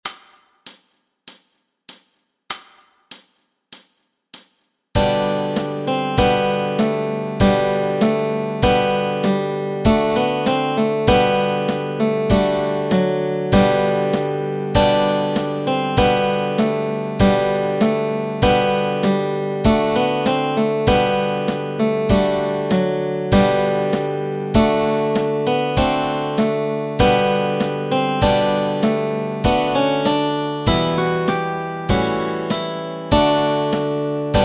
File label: Deck the Halls melody and chords